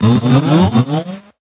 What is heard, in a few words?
talk